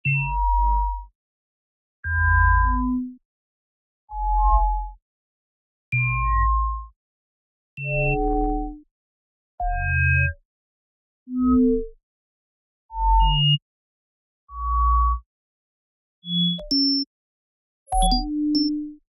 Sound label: Short Incoming Message Popup, Futuristic Interface; Justsoundeffects